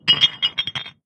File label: Gold Bars; The Town